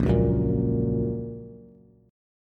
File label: Bb Minor Sharp 5th, Bass; 6,4,4,3; strummed; chord shape